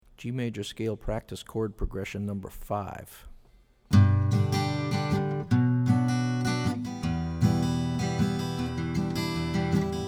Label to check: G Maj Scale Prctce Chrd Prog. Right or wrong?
Right